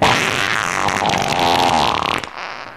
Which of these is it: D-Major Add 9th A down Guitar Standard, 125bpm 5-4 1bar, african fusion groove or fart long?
fart long